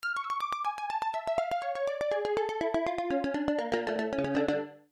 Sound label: synthpiano synth piano